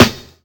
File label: bassy acoustic snare sample a sharp key; snare drum samples